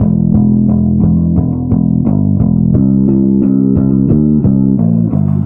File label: bass, Sad